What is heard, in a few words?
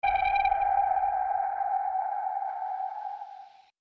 sonar